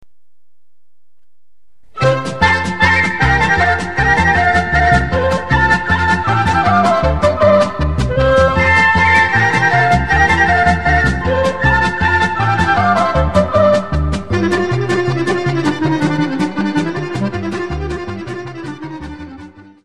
Kolo, Serbie

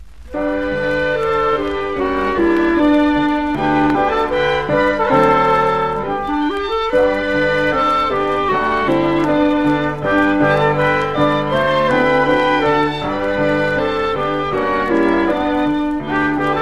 Allemande Chasse, Belgique

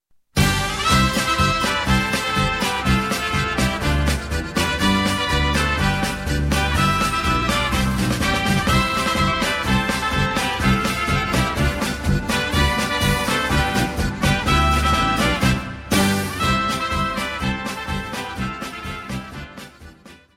Grashupfer Polka, Allemagne

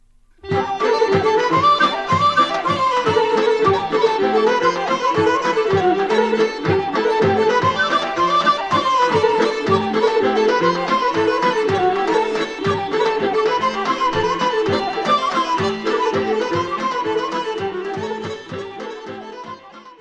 Kopanica - Bulgarie

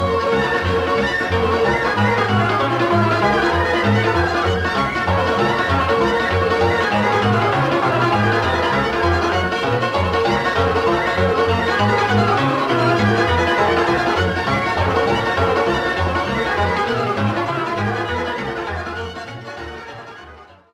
Sirba - Roumanie; Sirba Primaverii

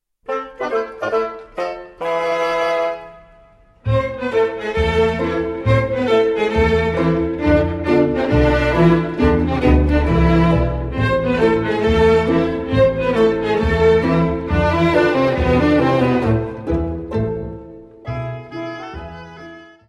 Scottish Finlandaise, Finlande

